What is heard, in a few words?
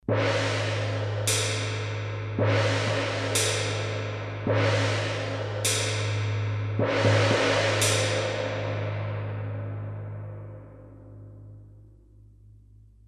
Mooer Synth Drum Patch Gong